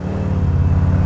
rev short loop, vehicles; premier

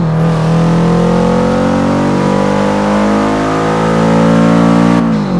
third, vehicles; gmod; tdmcars; sub wrx sti

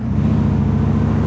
rev short loop, vehicles; schafter

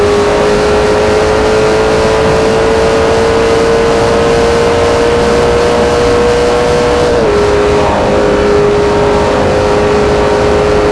fourth cruise, vehicles

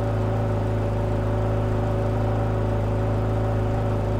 idle, ferrari 458; vehicles

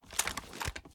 reload start; gmod

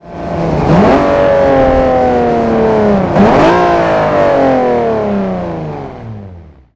throttle off, vehicles; gmod; tdmcars; s60

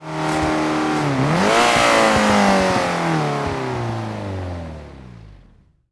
throttle off, vehicles; gmod; tdmcars; mr2 gt

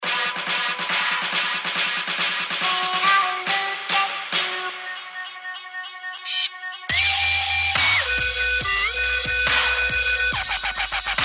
dubstep, ringtones; gmod; perp2.5

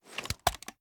sm uzulu reload empty xmag end; reloads; iw8; gmod

